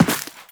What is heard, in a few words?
Run Dirt, Footsteps; Warframe; Teshin